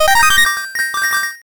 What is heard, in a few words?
jackpot